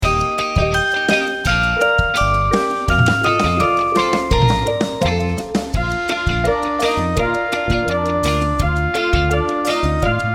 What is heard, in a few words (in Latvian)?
Rotala in Bb, Trompete